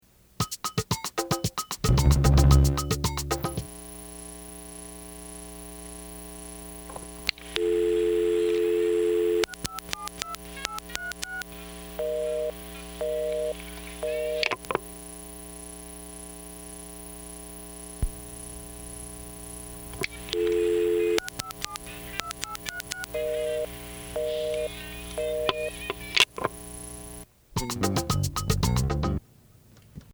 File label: drum machine and phone calls